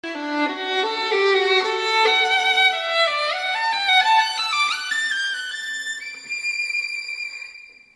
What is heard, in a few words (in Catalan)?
violi curt; descobreix l instrument